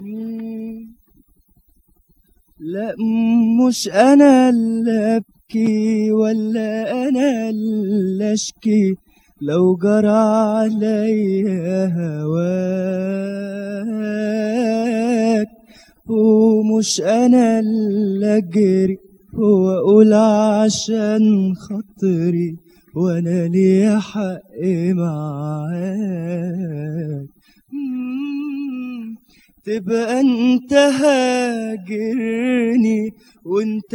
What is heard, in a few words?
solo